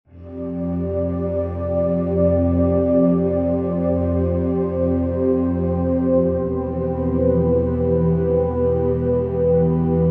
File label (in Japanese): bgm